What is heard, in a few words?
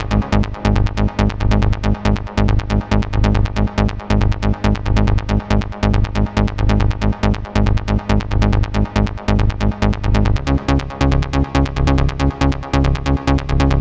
139BPM Bassline WET, G#minor; Vengeance Samples; VTS1 Brandmark Kit